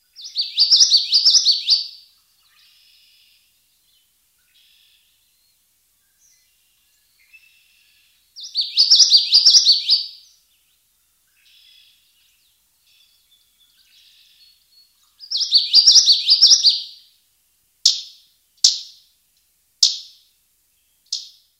Bird Songs Common Yellowthroat

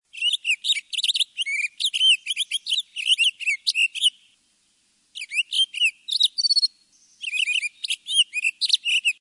Townsends Solitaire

